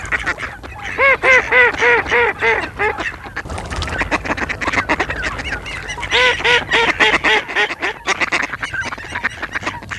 GERMANO

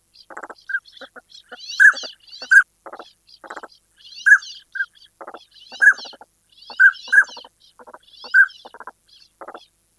CODONE

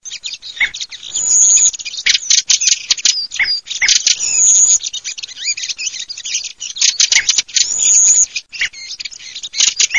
SASSELLO

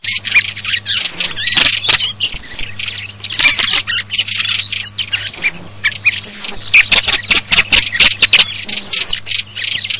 SASSELLO SCHIAMAZZO

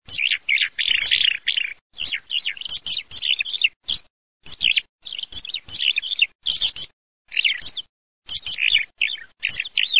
allodola pispola, birdsound

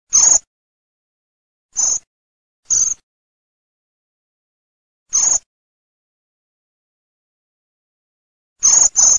MERLO STRISCIO, birdsound

